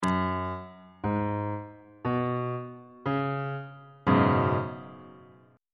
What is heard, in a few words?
c sharp dominant 7th suspended 4th 1st inversion on bass clef